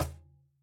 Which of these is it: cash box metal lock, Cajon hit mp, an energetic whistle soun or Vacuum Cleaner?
Cajon hit mp